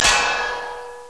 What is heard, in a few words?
clang; Leisure Suit Larry 6 - Shape Up or Slip Out; Floppy DOS; scummvm